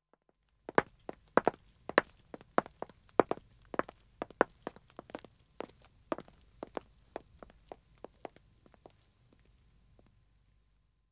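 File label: Wood (Dead Acoustic) Walking 1 man, Footsteps; BBC Sound Effects Library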